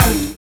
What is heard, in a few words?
WHAA; Univers Sons - Kitch 70s